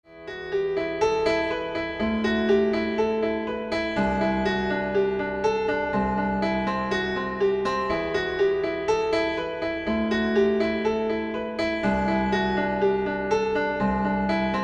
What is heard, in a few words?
Beat Rap